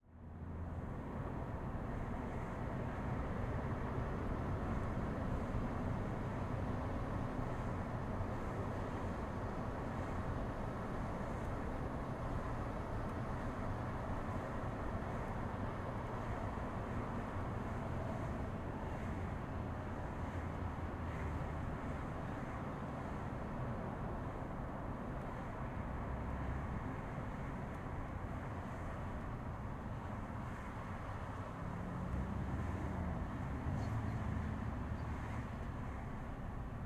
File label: Auto Interior On Busy Freeway Driving Fast Windows Open, Car Bys Fast; Surround; 5.1 LCRLsRsLf; City; 2496SoundEffects; Surround In the City 5.1